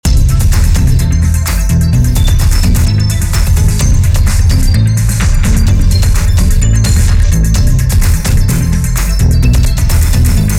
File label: light weight electro